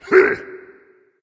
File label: grunt attack dragon; fafnir